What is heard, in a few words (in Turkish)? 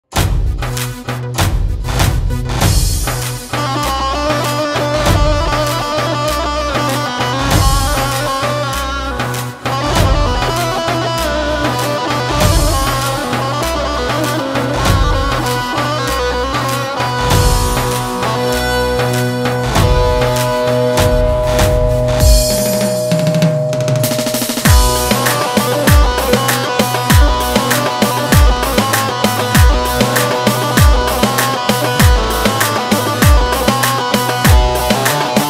halay; stüdyo ays